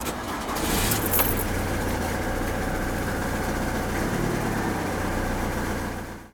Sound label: starting engine Ford Mondeo Mk 3 diesel